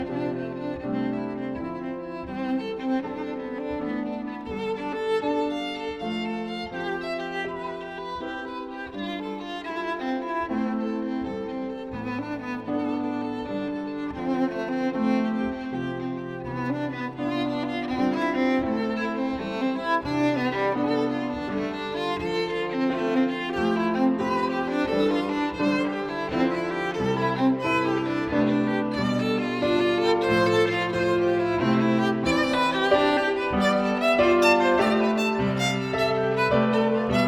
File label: Violin Sonata; Violin Sonata No. 3 2nd mvt.